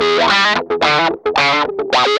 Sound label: GUI, LICKS; 110 LICKS